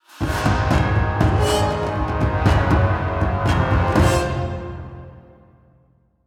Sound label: Stinger